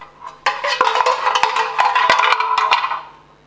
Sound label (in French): bruits metalliques